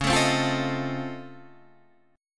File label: C sharp Augmented Major 9th, C sharp, down, Guitar, Standard; strummed; C#+M9; JGuitar